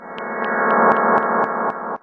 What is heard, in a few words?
echo evil space; Atmospherics